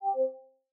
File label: notif